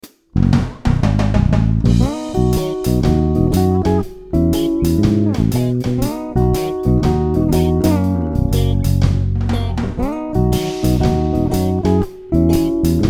reggae, bass

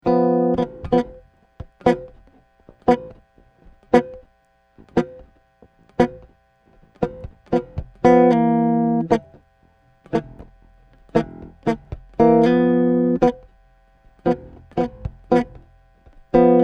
Boogie Chords; suhr classic j 4 string

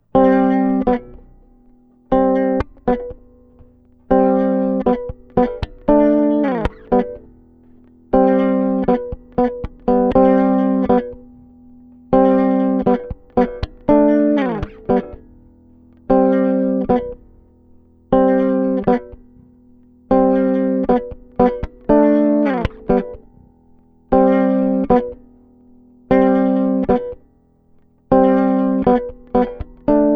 jam chords

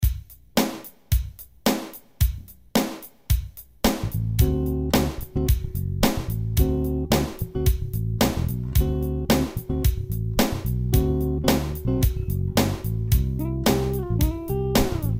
Jam Chords Only, basses

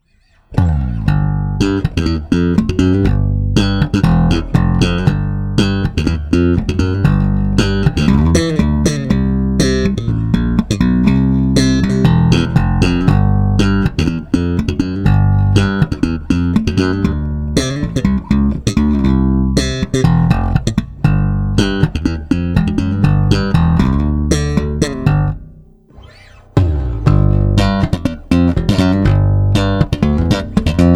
SLAP DIRECT

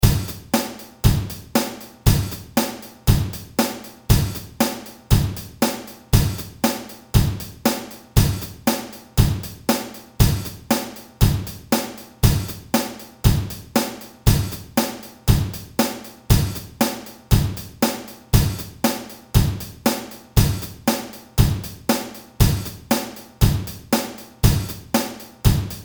Slap Ex Drums 118bpm; fun slap exercise

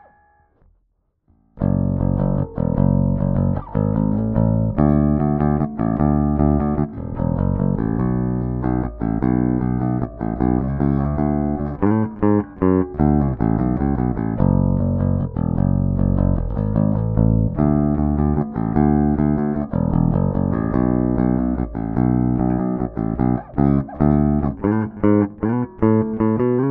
Lace Bassbar Ibanez Artcore; alumitone bass bars

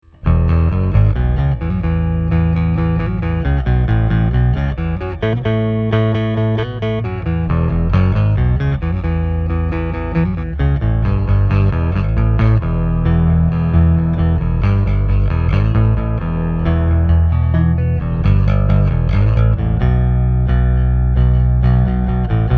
clean

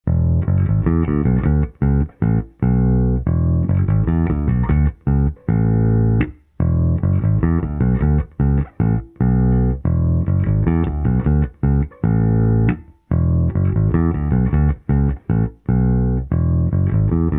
SVT 3015 p bass; SVT vs SVT7 Pro; replacing my svt classic

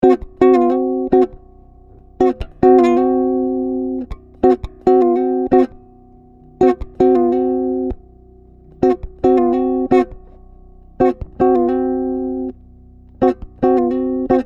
CHORDS

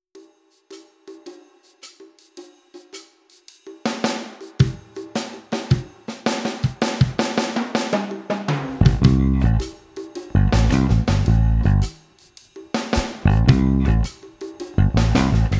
Passive Aguilar Split Coils, bass; king koa; nordys

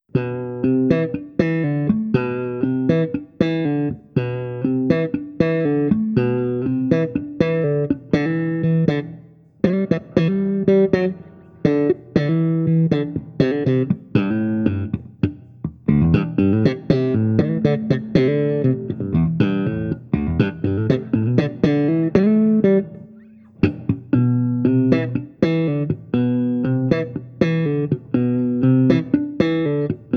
lead

